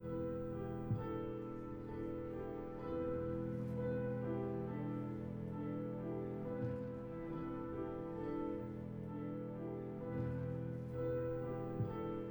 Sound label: jingle